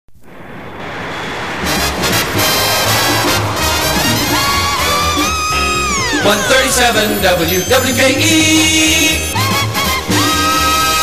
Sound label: jingle jets; WWKE